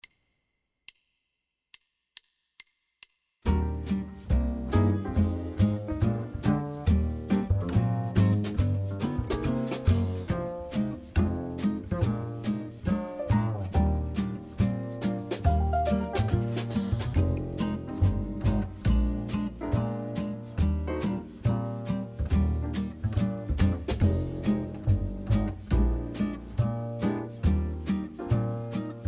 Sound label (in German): II V I Klingend Fm Bb7 Ebmaj